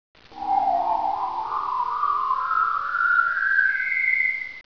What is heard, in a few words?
wuwuwu